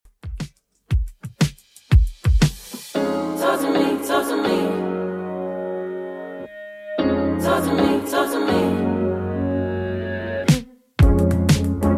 Top40 Redrum 119bpm

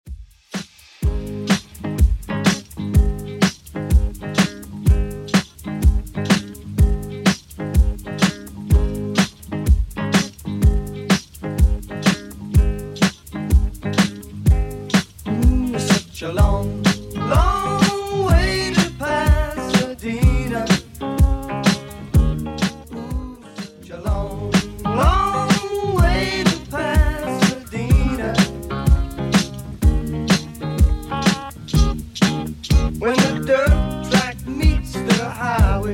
70s Redrum 125bpm

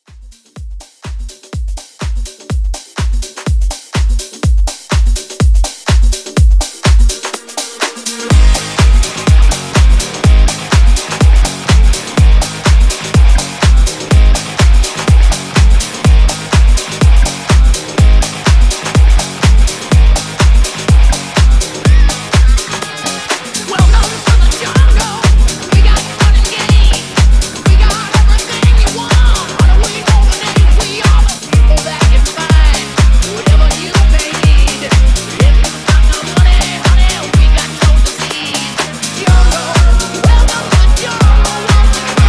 80s rock house, 124

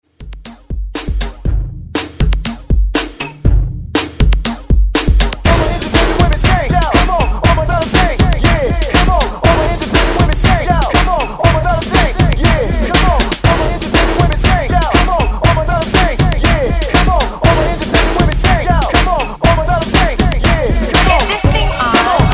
Hype ReDrum 120Bpm; DJDOLLS

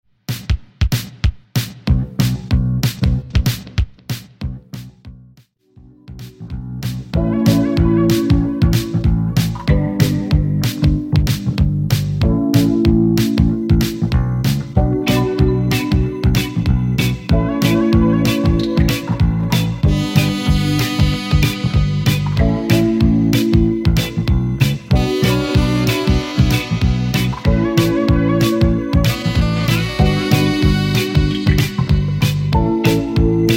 80s Extended

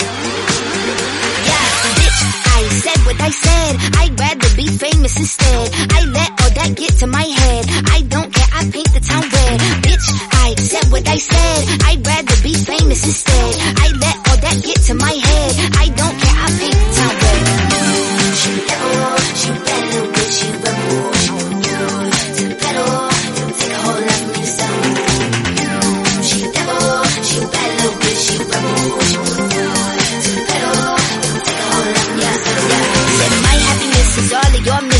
Disco 122 bpm Gm

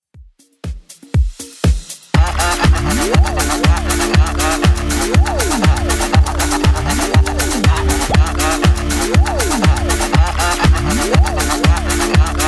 90s, 120bpm

